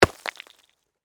Impact Ice Block, Hammer; Miscellaneous Impact; BlastWave

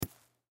Bullet Shell; Bullet Shell 223 Drop Sand; Drops (Sound Effects:Objects); Blastwave; Warfare

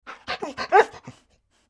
Dog Whiney Bark; Animal; Dogs; Blastwave

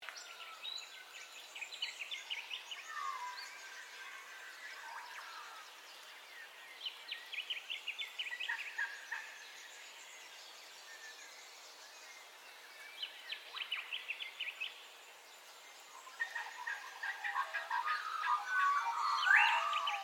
AMBIENCE DAWN BIRDCALLS, Wildlife Ambience; Environmental; Nature

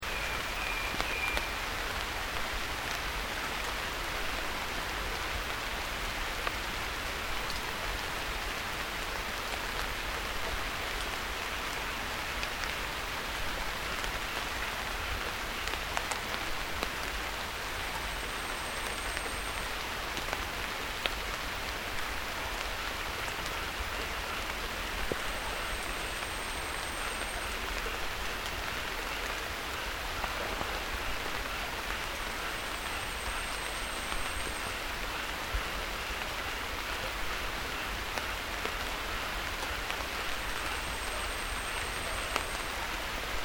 Ambience Tazawako Rain Light Forest Insects; Recorded in Golden Week in Japan 2008; Forests And Jungle Sound Effects; Foundation1

